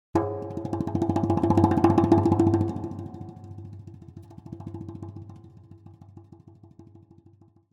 Drums Percussion Danger - Fast Drumming On A Thin Metal Percussion; Hollywood Edge; sound designer's toolkit v2